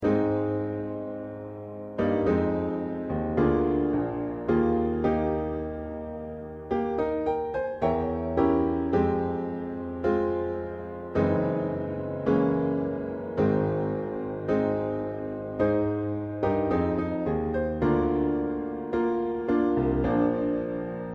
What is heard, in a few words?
Piano